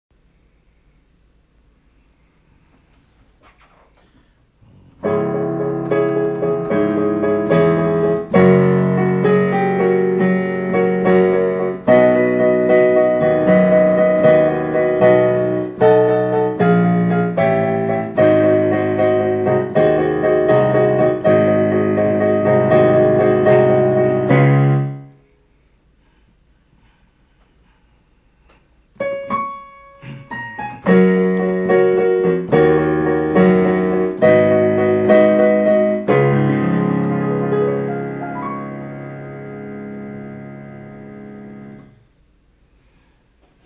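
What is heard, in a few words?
backups medley accompaniment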